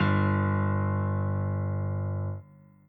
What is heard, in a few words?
chord in g